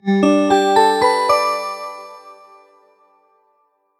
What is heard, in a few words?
new order alert